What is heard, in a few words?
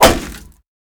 Bullet Impact Metal